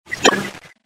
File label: chwawk